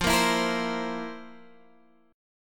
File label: F Major 7th Suspended 4th